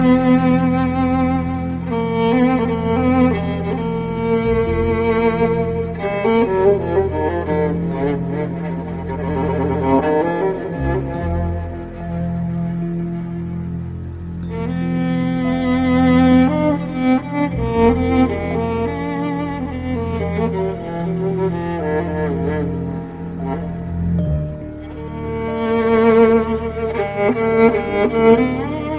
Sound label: egypt music